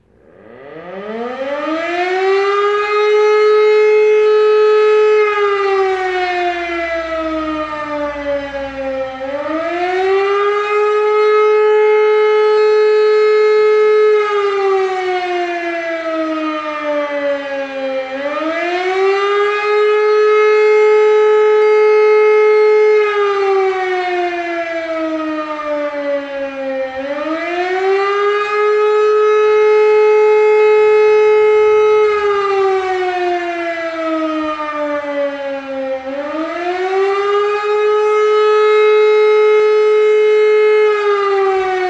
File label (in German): sirene bevoelkerungsschutz